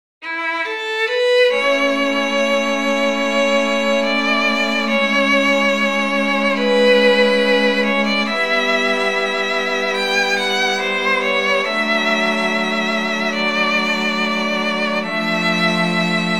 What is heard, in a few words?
asakage for string quartet